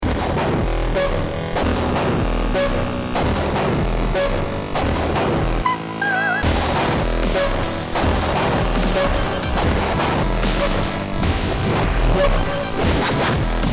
start round, music

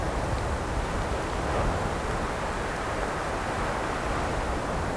desert med wind; ambient; atmosphere

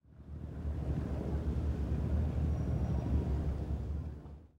dust car driveby; ambient atmosphere; csgo; Counter Strike Model Pack

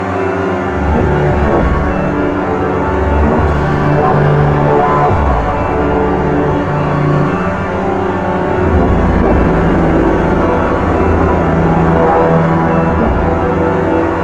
vault gravity beam; Half Life Alyx; music

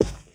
carpet, footsteps; player

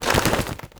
wings hop, pigeon; critters

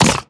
bite, headcrab; reviver; creatures

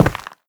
step run, footsteps, player, dirt

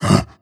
breath short, zombie

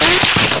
grind flesh, manhack; Half Life 2

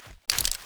stilletto flip, knife; weapons; Counter Strike Model Pack; CS2

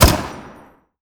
mg mech, weapons; combine; Half Life Alyx

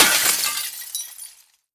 break glass phys medium; physics; breaking

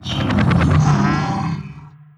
dry, strider idle; creatures; combat; vox

